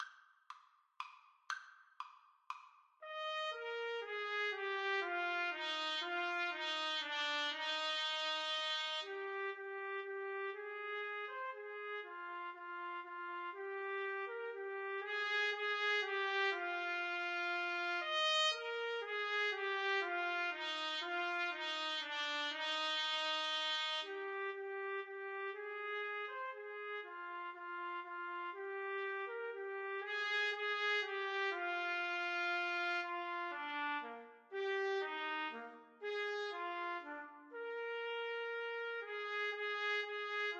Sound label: Menuett TPT duet